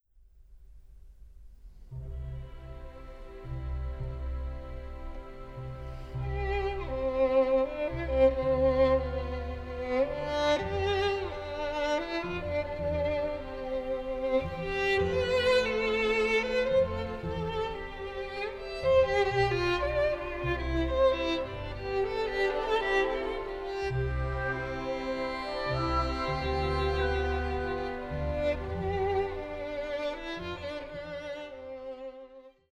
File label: romance in c major